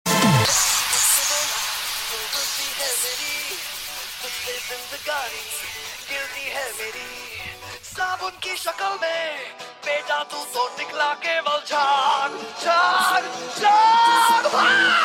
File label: Beats; Delhi Belly